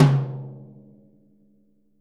Living Drums; Sampleheads; Peter Erskine

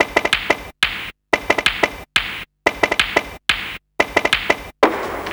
Pool Hall Beat, Drum & Bass; Fluid Dynamics

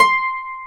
Celtic Harp C5; Planet Earth; Digital Sound Factory Planet Earth

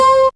Mandolin C5, Fairlight CMI IIe

